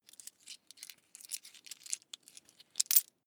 coins clatter soft

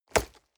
nylon leather hit hard

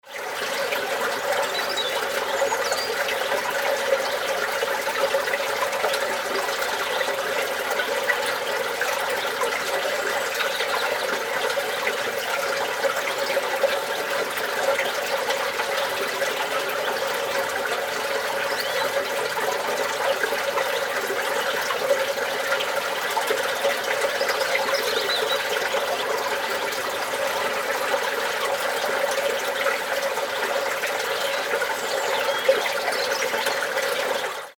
water filling in birds in background

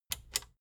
plastic button small latch click disable slow

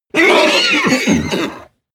horse vocal die

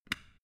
switch small turn on soft

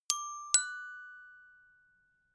notification glockenspiel third up

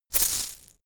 insect shaker hit double hard

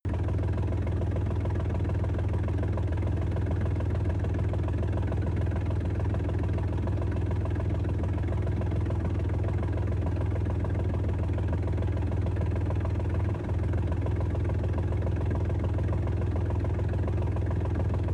tank large engine slow idle steady

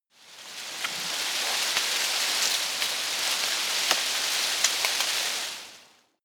tree foliage rustle medium

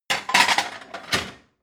plate multiple shelve hard

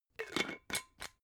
glass bottle massive close

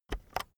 keyboard external enter press slow

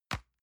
envelope drop multiple medium

